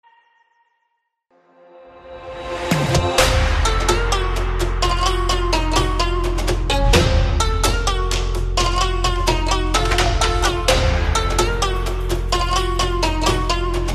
electro triangle

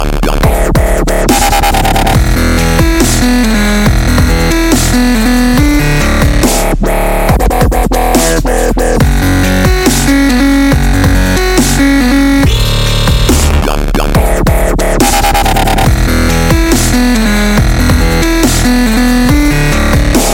dubstep; iphone9

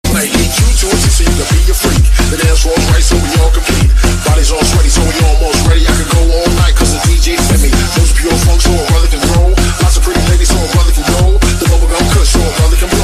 dj nokia rap, ringtone

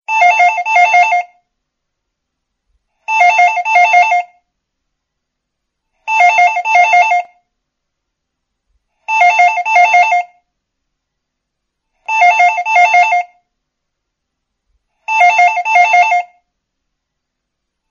telephone ring

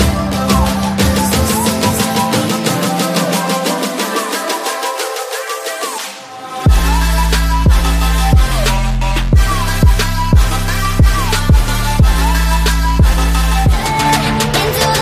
beat

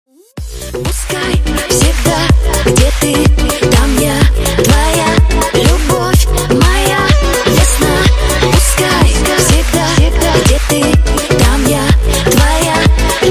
arabic

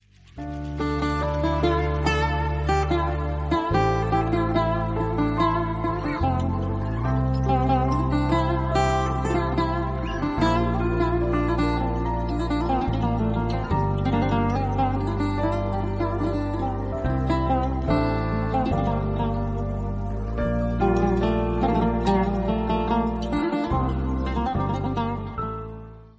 nice turkish tune

